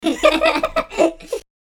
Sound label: Giggle